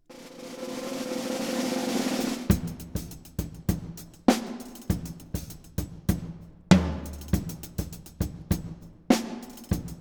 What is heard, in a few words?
Percussion Imaging Test Drum, 3ft, Live Studio Test